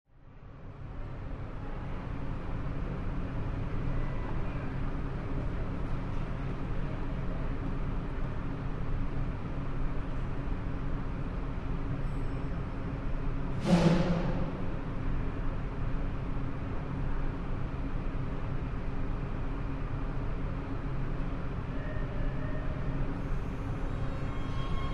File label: Subway Train Hum Wall